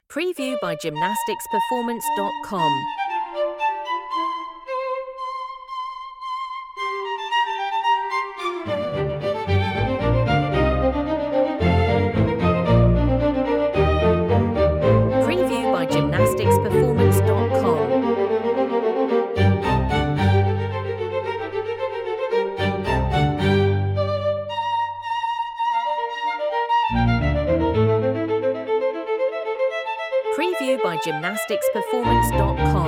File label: violin dance